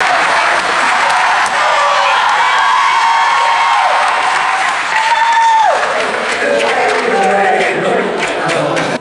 audience clapping and cheering with faint voices; crowds

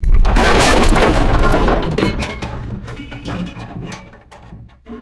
small metal scaffolding collapsing and settling; destruction

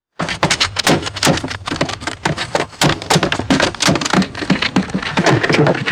synchronized grounding of military rifles; foley

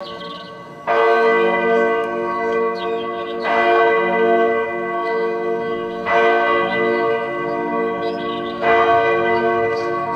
large church bell tolling with birds chirping; bells

